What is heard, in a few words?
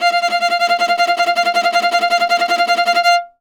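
viola tremolo F3; Orchestral; DirectWave; Varazdin Orchestral; Viola II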